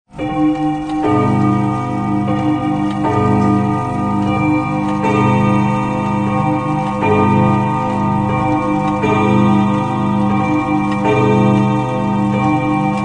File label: Chiming of a grandfather clock; Clocks; Machines